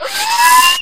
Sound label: drone fly; dn3d r